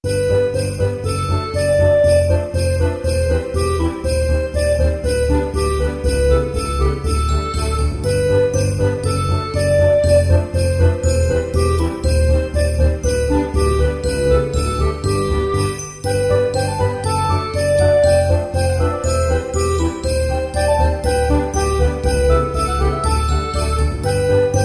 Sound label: xmas bgm